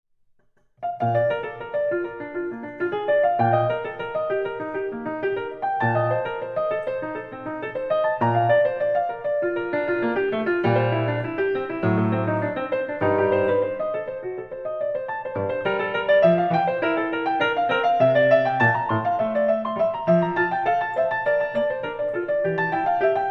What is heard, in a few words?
Partita1 Bb Allemande, Klavier Barock; JS Bach